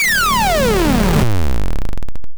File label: shutdown